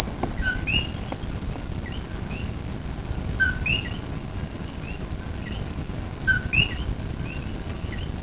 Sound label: coqui